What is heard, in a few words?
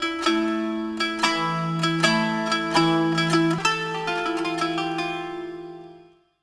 SIERRA GUI; Best Service Advanced Media Trax Building Blocks